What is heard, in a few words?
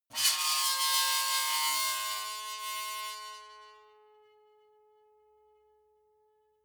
metal squeal bright; metall